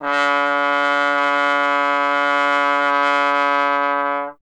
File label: Trombone C#; Miroslav Vitous - Solo Instruments VOL-1